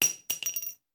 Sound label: Bullet Shell; Sound Ideas; Guns